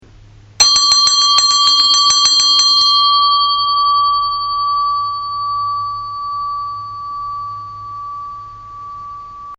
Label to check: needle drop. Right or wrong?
Wrong